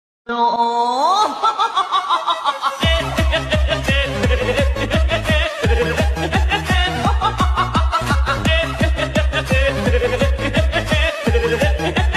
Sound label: japanese laugh